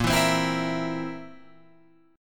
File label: Bb Suspended 2nd Flat 5th Bb down Guitar Open F; strummed